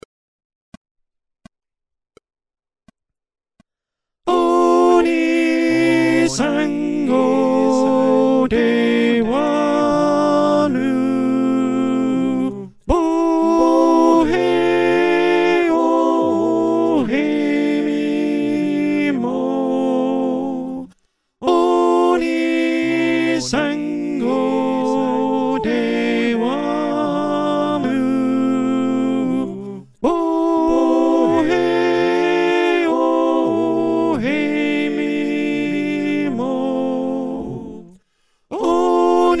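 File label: Alto, choir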